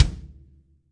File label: yam kick, Real Kit; REAL LIVE KITS